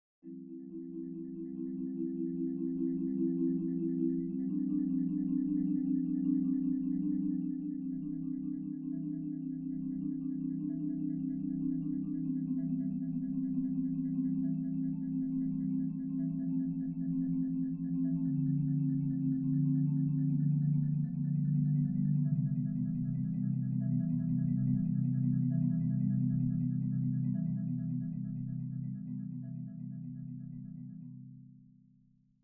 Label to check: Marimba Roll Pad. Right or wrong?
Right